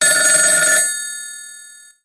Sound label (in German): telephon; echo